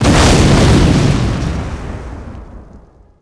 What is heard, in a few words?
Explo Large; impacts explos